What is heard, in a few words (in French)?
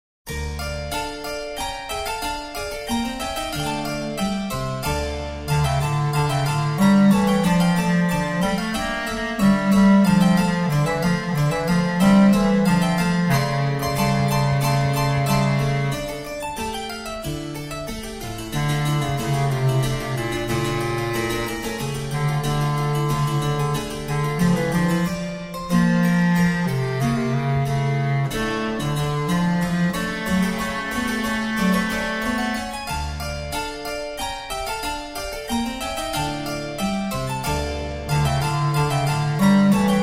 Instrumentale